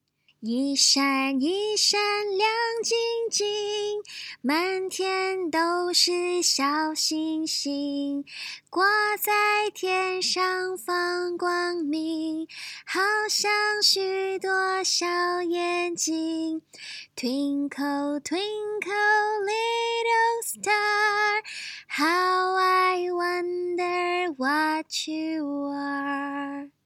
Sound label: Sing